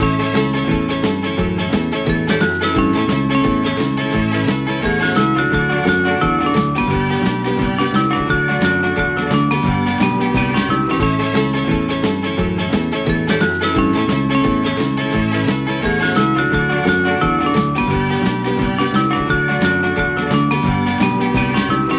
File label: Swing Dancing Loop